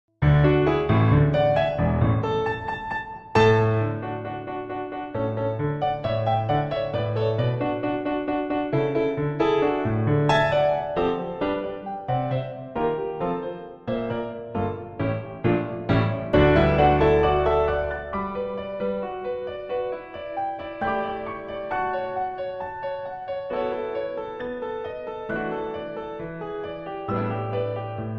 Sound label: ACCOMP